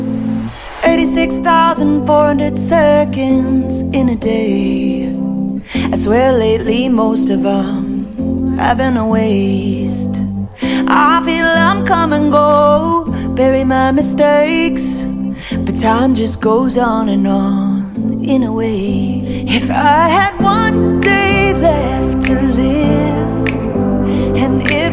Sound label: Only Love